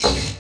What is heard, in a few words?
PERC, Drum & Bass; Ueberschall; Drum & Bass Flash Trackz